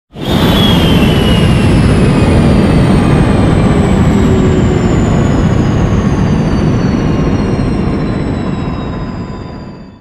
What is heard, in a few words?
engine tvd stop cockpit; War Thunder